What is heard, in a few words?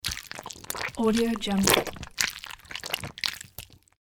juicy gut squishy